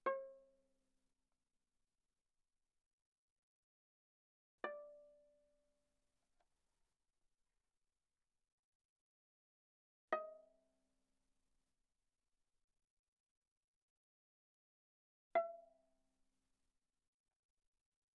Viola pizz sul D ff C5 B5

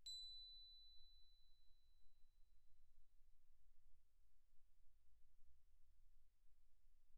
crotale B7 pp; Percussion; crotales

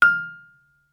Vibraphone dampen ff F6 stereo; MIS Pitches - 2014; Percussion

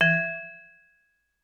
Vibraphone dampen ff E3 stereo; MIS Pitches - 2014; Percussion

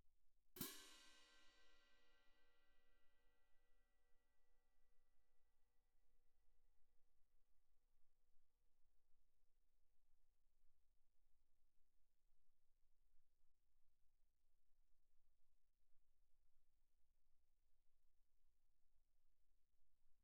18 orch crash, viennese, pp, crash cymbals; Percussion

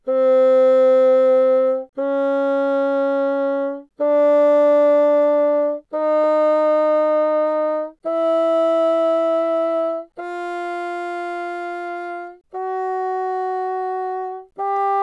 Bassoon ff C4 B4, woodwinds

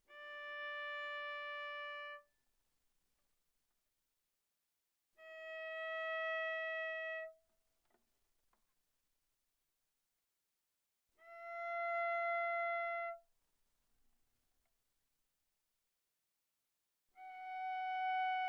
Viola arco sul G mf C5 G5

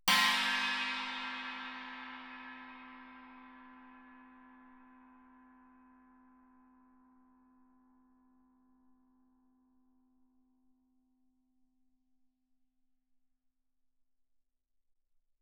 chinese stick normal ff, suspended cymbals; Percussion

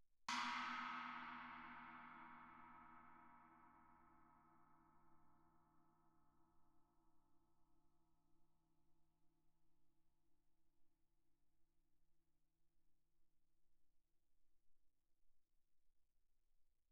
chinese stick shoulder mf, suspended cymbals; Percussion

